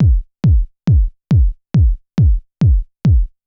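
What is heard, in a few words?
bass drum, Techno 138 BPM; Best Service ProSamples vol.54; AKAI; HELLS DOOR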